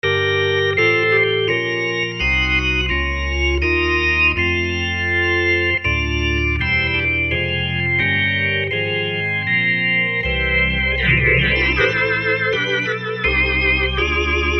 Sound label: Leslie